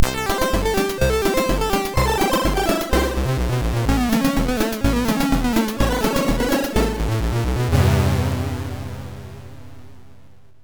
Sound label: C64 Waiting